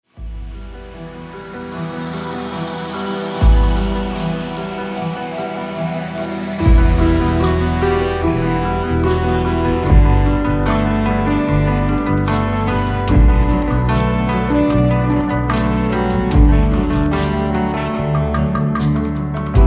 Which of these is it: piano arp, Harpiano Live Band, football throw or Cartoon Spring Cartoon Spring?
Harpiano Live Band